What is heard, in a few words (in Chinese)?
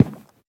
樱花木踩踏声